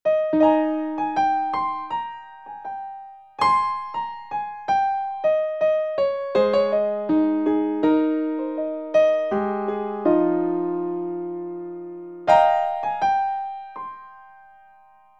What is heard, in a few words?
piano section, piano is cool though